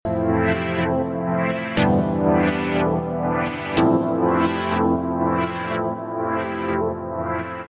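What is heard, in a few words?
deep house chord fade out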